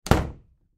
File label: Bedroom Door Slam, Bedroom Door Slam Shut; Household